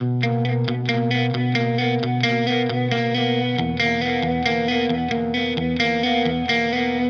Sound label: 135 Pop Guitar Lick E maj, Electric; Pop Rock; Electric 100-160 bpm; Pro Tools Essential Loops; Digidesign